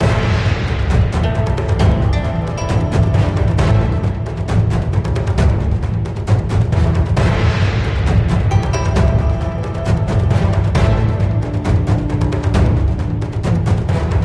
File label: Big Win Music Tally; 108 heroes Multiplier Fortunes Desktop; Mahi Gaming